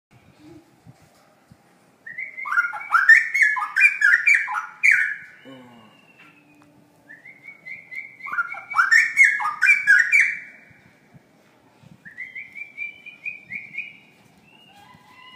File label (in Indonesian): Suara Cucak Rowo Gacor; Juara Durasi Panjang